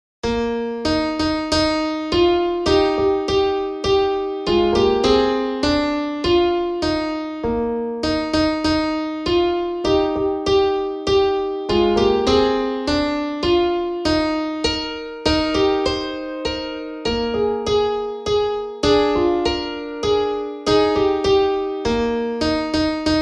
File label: oh christmas tree